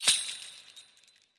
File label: Bell Strike; Santa